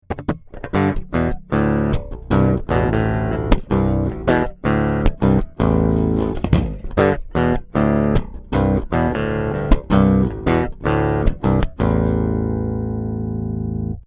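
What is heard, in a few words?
Warw Strea Brid Pick, bass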